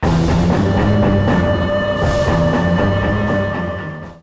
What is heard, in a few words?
Modern Agitated; Civilization Revolution